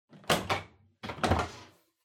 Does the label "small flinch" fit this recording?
No